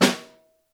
Room, Snare; Ableton Foundation FreePacks; Snare Designer

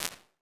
small booth; Ambience and Small Rooms; Ableton; Convolution Reverb

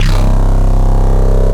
Stadium Bass; Stadium Bass Selector

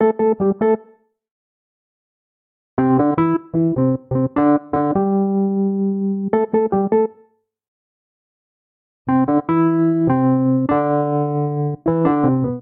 Phrase Dub A Minor 76 bpm, Electric Keyboards; Ableton; Factory Packs

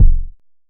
analog deep, Kickbank

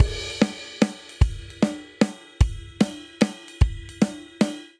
Swing Waltz Chorus 3/4 150 bpm; MIDI Clips; Ableton; Factory Packs; Drum Booth

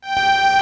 bomba G5; Ableton Foundation FreePacks; Foundation Glitch Toys & LoFi

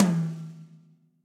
Tom High Big Punchy; drum hits; Ableton; Factory Packs; Session Drums Club; Standard Hits

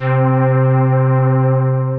Frost Waves Pad; Synth Pad; Ableton; Factory Packs; Synth Essentials

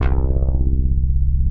Curtis Apartment Bass; Poli; Basses

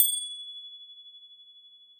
Finger Cymbal; Latin Percussion; Drums; Drum Hits; Ableton; Factory Packs

